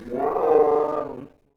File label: hurt